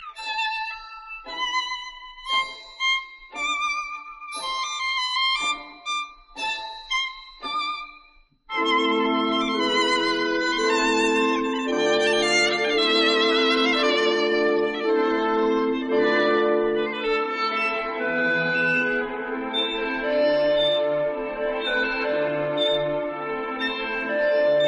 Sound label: russian dance